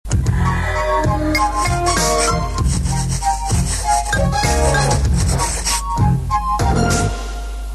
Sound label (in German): werbung jingle alt; tele5; tv total